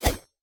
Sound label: Slashing Long; Folk Hero